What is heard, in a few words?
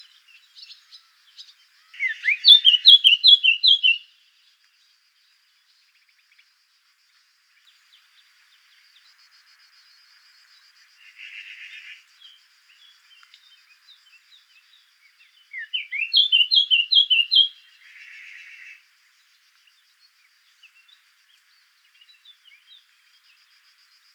call, Blackcap, Background: Magpie call and Blackcap song.; sylvia atricapilla